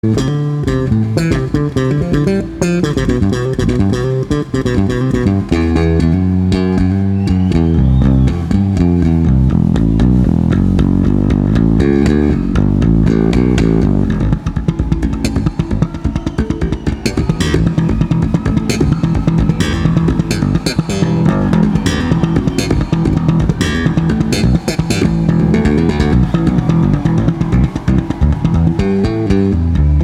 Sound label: Bass Solo